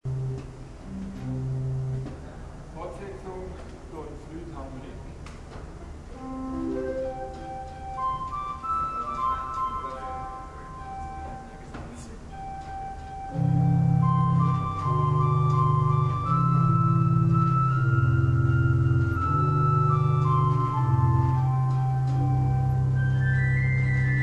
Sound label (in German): flute 4 8 mit bourd